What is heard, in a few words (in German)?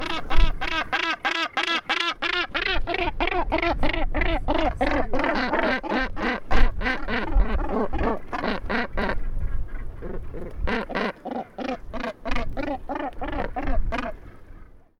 basstoelpel